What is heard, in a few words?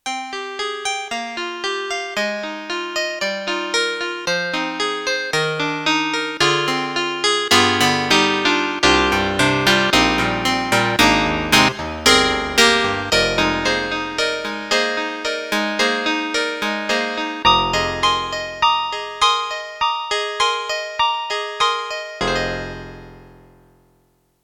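YAMAHA MU1000EX Clavi, piano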